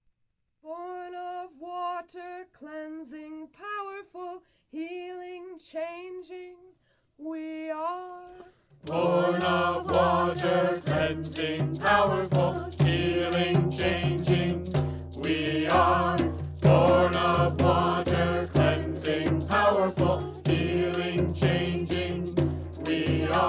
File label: Chants